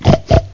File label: grunt; Take 2 v1.0; Animals